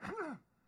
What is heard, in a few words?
Karate Kick Sound